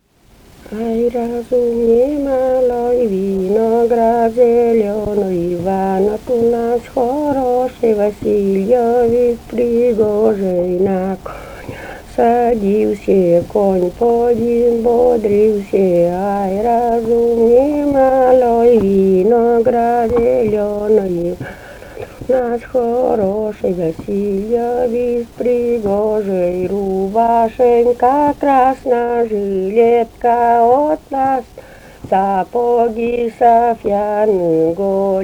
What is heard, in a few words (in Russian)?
Ай, разум немалой, виноград зелёной (свадебная), Фолк